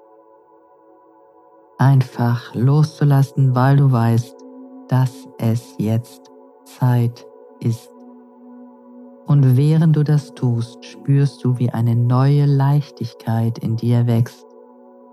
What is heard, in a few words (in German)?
Musik; wenn trauma dick macht